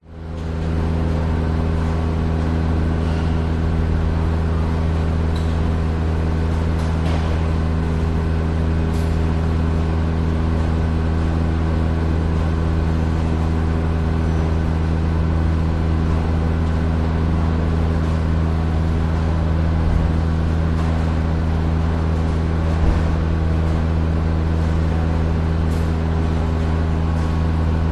industrial warehouse empty atmosphere motor voices next door; zapsplat